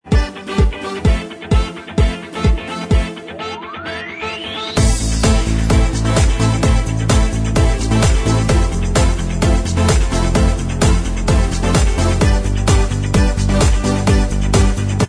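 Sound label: instrumental, track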